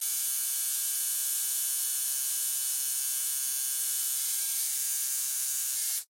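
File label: tattoo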